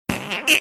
making fart like noise

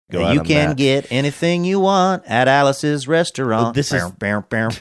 singing

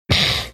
snorting

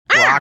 cawing like crow

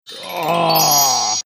disgusted groan

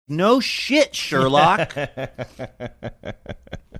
saying no shit sherlock laughing

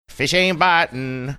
singing fish aint bitin

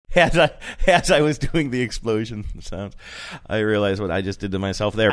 explosion sounds

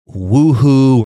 woohoo

